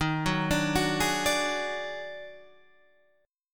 D sharp Minor Major 7th Double Flat 5th D sharp Guitar Standard; arpeggiated